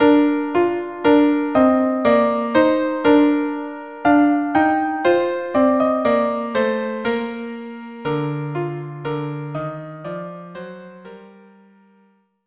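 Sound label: violin cello; Maoz Tzur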